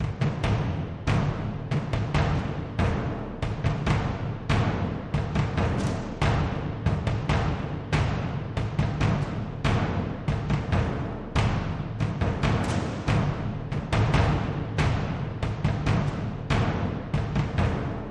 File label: Thunder Front Loop Layer Toms